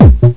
rhythm tone, bdrum samples

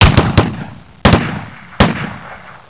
RIFLES; MPG-Net; garden

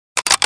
springfield reload end, weapons foley; Spearhead Demo

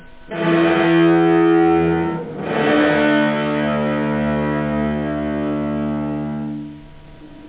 Gated Reverb, Guitar; Meeting Pearls III

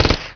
smuzi gun; SpecOps2 MPlayer Demo

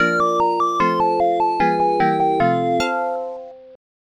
tune; Assassins 2 - Ultimate Games No. 2; Weird Science; Amiga CD32 CDTV; wally

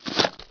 step jungle; SpecOps2 MPlayer Demo

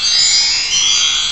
sparkle loop; Ferazel's Wand Demo

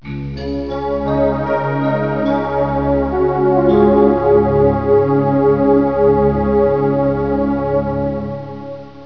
Logo Lick

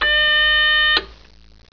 BEEP BUTT

